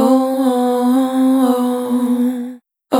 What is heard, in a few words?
Dry, Multi-Voice Loops, Vocal Samples; Surfacing; Sonic Foundry (Sony Creative Software) - Pocket Diva World Class Vocal Samples